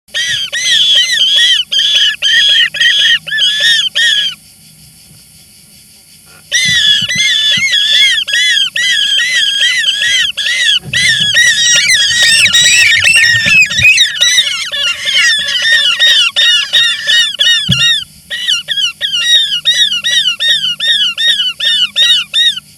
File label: European Roller; Gokkuzgun